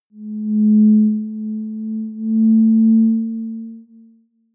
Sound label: simple moving sine short